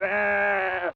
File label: Sheep; rough